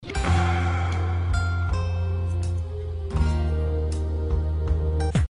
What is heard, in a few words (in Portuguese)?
som musica triste; ytpbr; fail